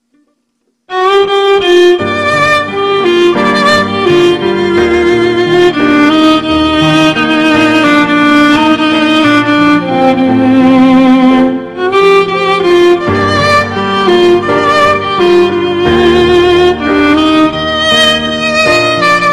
Super Violon Mix

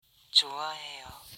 Text Tone; Yoosung2

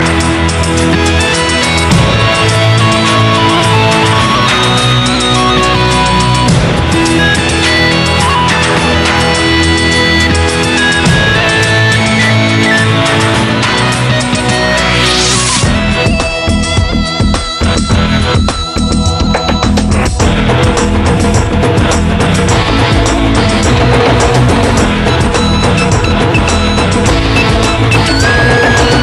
3d Guitar Tune

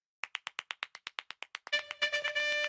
Clapping

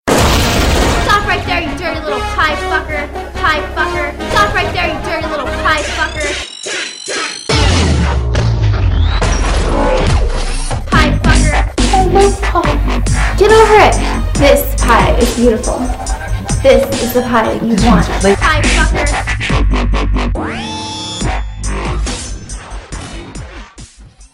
Piestep

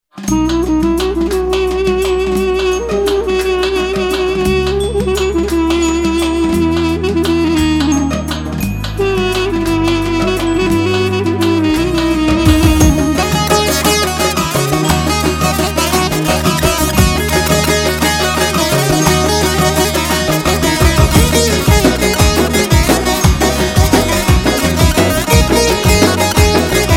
Saz

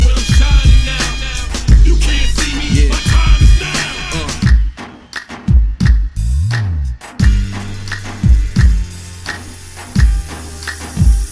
Arabic Remix

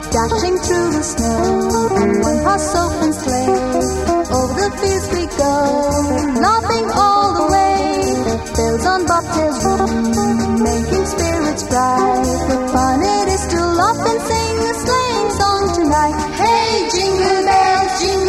Jingle Bells

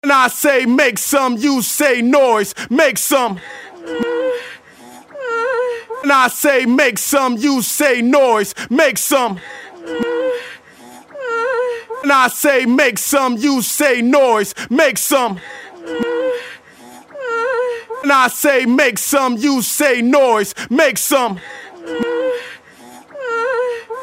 haha; putin

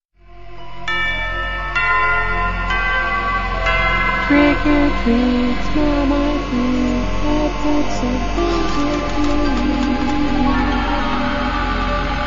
Haunted House

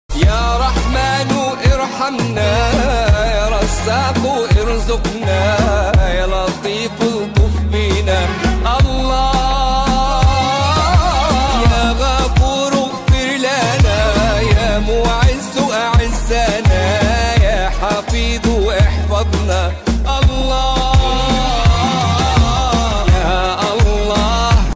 Ringtone

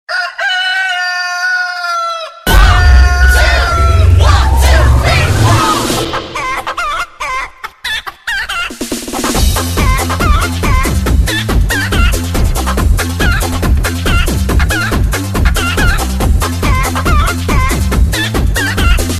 Chicken Disco

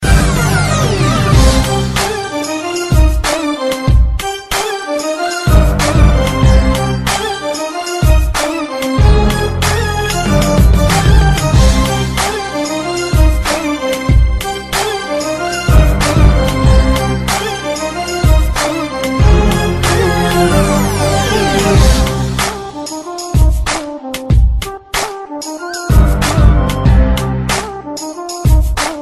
New Arabic Style

